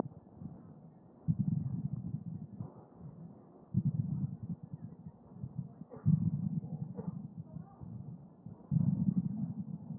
CHEST

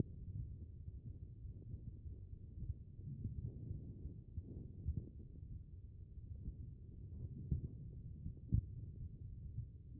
HEART B MODE

